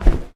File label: bird flap